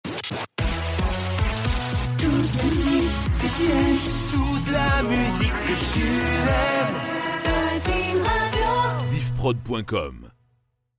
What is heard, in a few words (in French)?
Radio Jingle Chante 112 Bpm; Thirteen